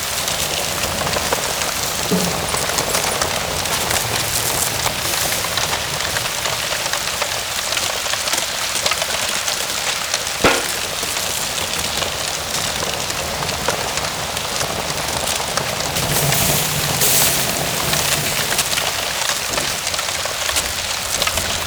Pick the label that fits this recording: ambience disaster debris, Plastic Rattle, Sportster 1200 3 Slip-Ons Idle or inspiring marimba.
ambience disaster debris